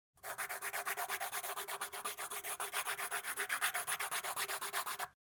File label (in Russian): zvuk karandasha